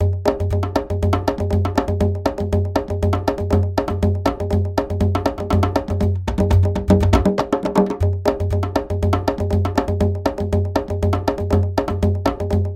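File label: latin american conga tumbadora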